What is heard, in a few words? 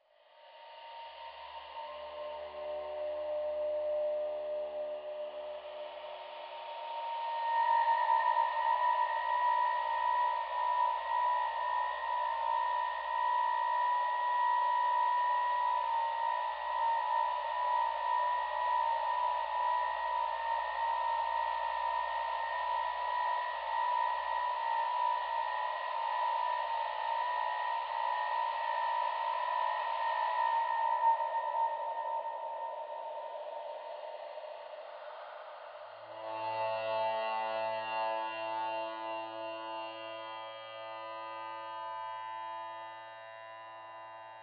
Rusted Ship, Ambient; SFX; Ambient - General